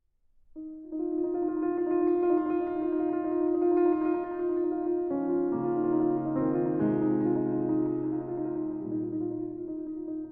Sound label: Valse No. 5 in A-Flat Major, Op. 42